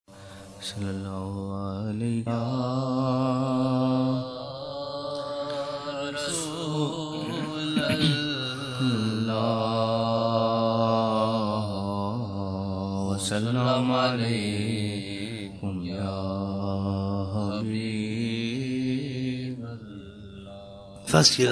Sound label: naat; betha apni kashti meh mujh ko bhi leh chal